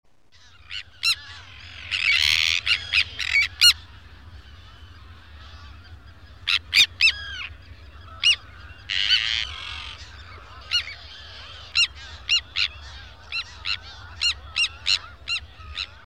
charran patinegro

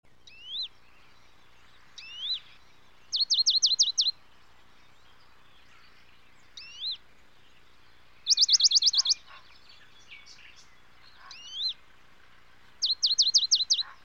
mosquitero papialbo

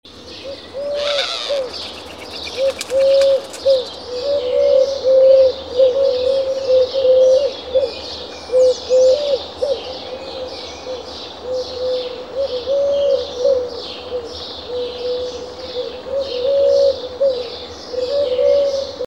tortola turca

